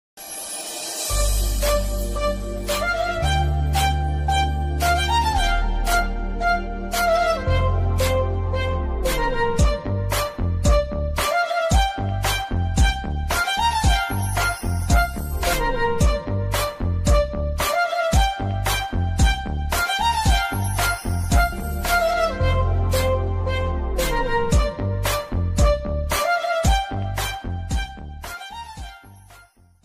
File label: romantic ringtone extended version; mr and mrs ramachari